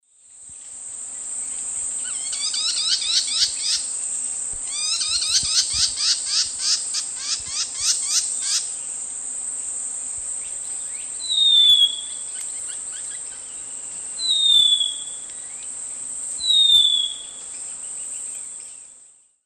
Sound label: Hylophilus ochraceiceps, call and song; S. America; Eastern Lowlands